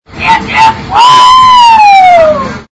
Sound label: yip yip